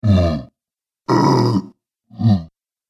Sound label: Giants Ogres, Monster Sound Effects